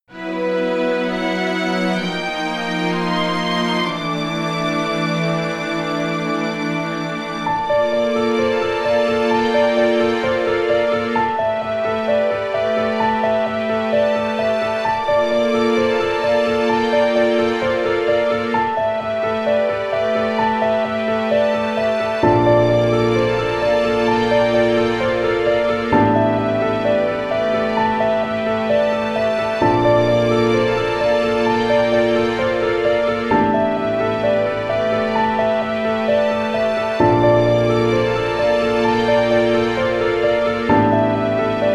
Defeat; Danball Senki

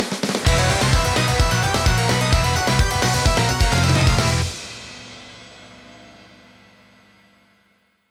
Win Jingle

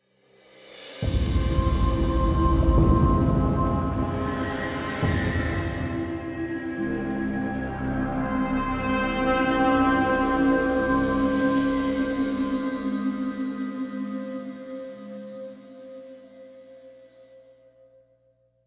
Combat Dogfight

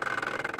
chariot Gear; Puddle

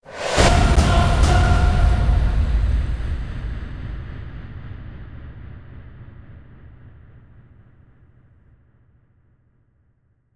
Mission Success; Space Hulk: Ascension

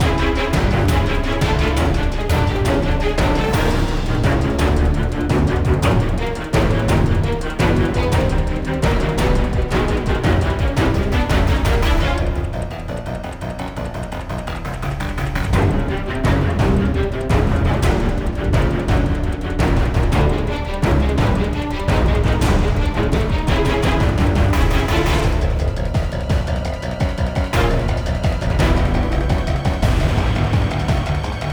Boss fight

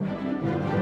Music Menu 144bpm Start

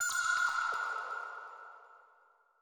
chime; chime sharp

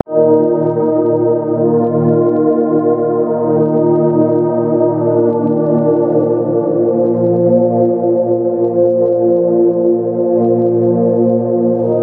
Club ambient; Cruelty Squad

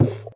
Collision

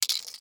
SFX Tabs Screen Minus

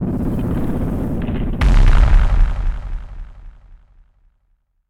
Totemclear

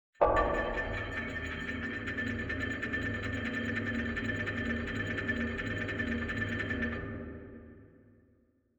Clock Gear Turning; City SFX

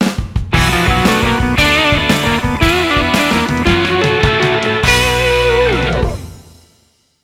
Jingle Beginning; Fish On; 3DS

